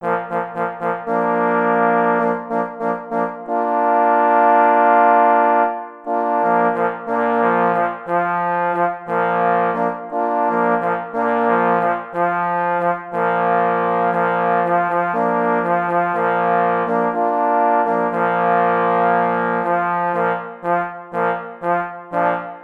Hejnal na 3 parforsy; Cz. Trybus; Hejnal strzelcow

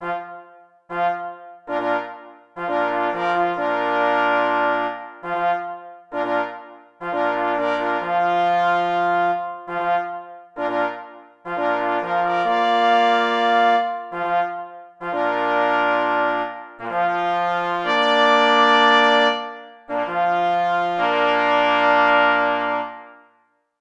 Kuropatwa na rozkladzie 2 plesy i 2 parforsy; K.M. Kroskowski

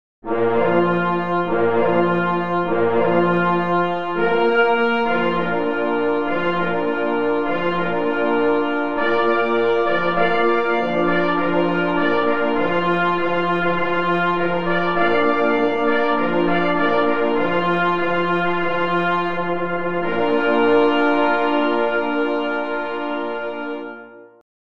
Hejnal, na 2 plesy i 2 parforsy; Hejnal KL im. Wojskiego w Gdyni

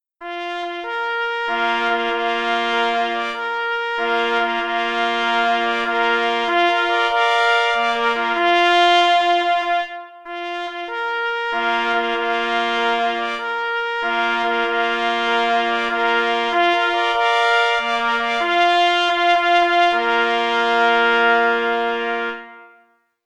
Hejnal na 3 plesy; Hejnal PZL

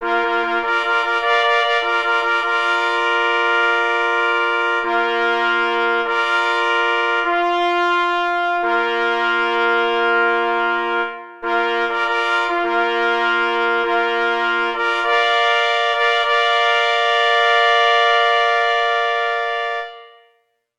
Mysliwski zew na 3 plesy; Cz. Trybus

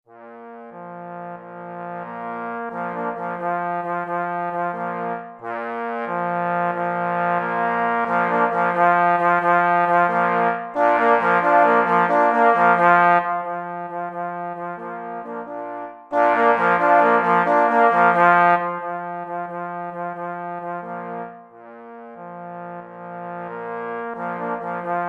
na 3 parforsy; W droge mysliwi glos trabki plynie

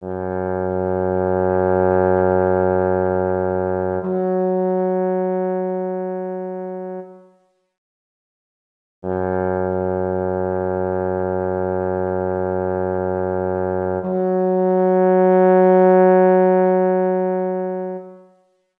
Ostrorog Strebowanie psow; pies w muzyce lowieckiej

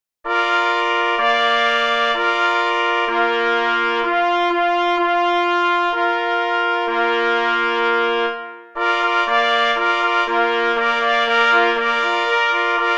na 3 plesy; K.M. Kadlec; Na czesc czerwonego psa; pies w muzyce lowieckiej

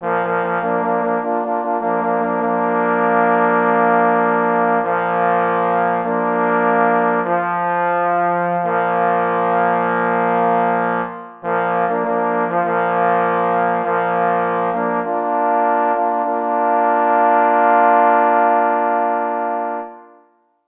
Mysliwski zew na 3 parforsy; Cz. Trybus

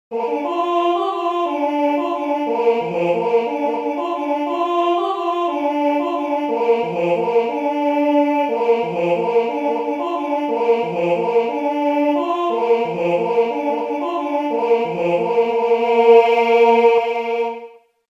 Pobudka, sygnaly mysliwskie; sygnaly mysliwskie ze slowami

